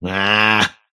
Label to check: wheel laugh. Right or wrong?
Right